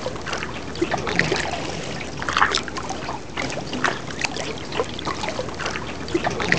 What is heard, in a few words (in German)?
WASSER